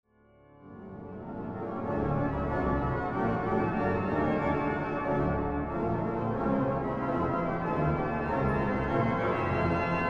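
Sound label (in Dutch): Basso Ostinato, orgel; Mijn Geroep Uit Angst En Vrezen - Zou God Zijn Gena Vergeten - Heilig Zijn, O God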